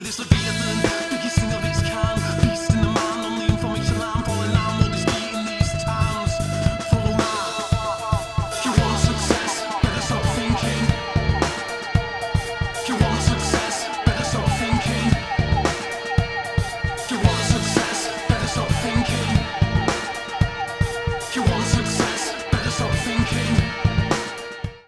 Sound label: bass no gated sfx